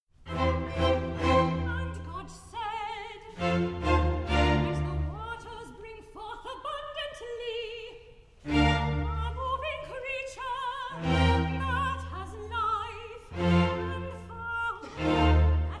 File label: Recitative; Gabriel; And God said, let the waters bring forth; creation